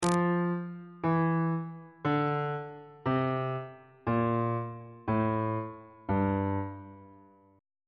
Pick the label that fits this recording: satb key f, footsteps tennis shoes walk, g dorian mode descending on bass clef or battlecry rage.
g dorian mode descending on bass clef